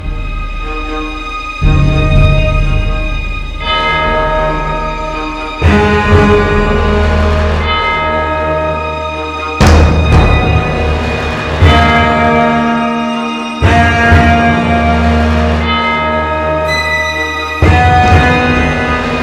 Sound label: cinematic dramatic music